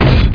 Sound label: Sword; lamsword